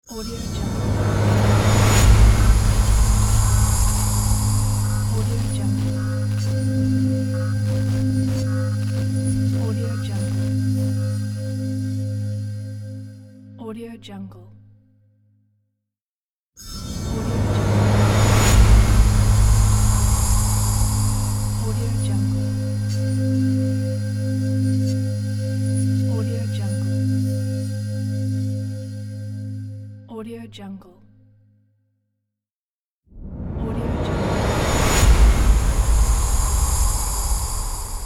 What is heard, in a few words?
space whoosh impact